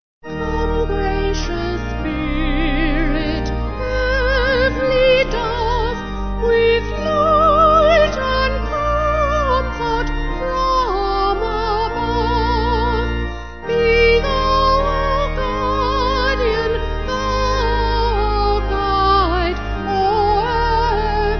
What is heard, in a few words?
Vocal Organ